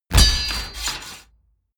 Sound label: medieval construction start